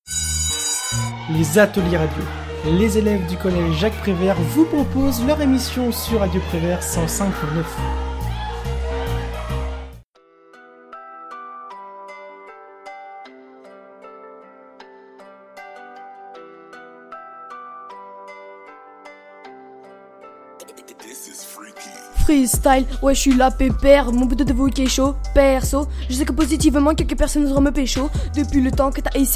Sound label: Freestyle; Les Ateliers radio